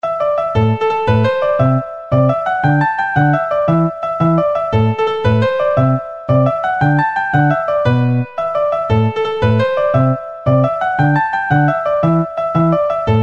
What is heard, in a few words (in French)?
Farandole du Vivarais, Basse